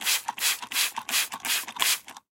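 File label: spray sounds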